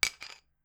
glass; Phasmophobia

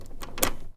Key lock; Phasmophobia

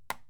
Plastic Impact; Phasmophobia

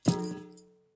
Censer drop; Phasmophobia

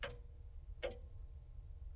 Grandfather Loop; Phasmophobia

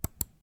Mouse click; Phasmophobia

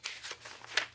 Page turn; Phasmophobia

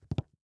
FOOTSTEP Leather Wood Hollow Jog mono; Phasmophobia

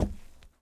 FOOTSTEP Trainers Wood Boards Walk mono; Phasmophobia

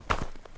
footstep trainers snow compact walk fast; Phasmophobia

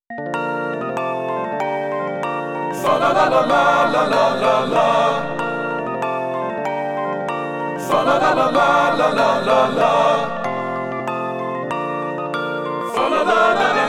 Instrumental; Hoxton

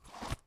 Voodoo Pin; Phasmophobia

